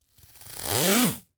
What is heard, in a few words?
Industry Tape Duct Tape Peeled Back